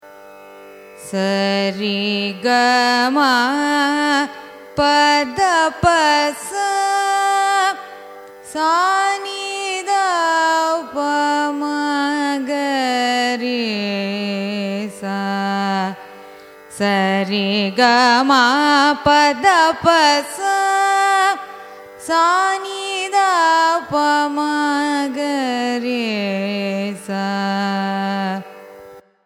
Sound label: raga poorvikalyani arohanam avarohanam, carnatic music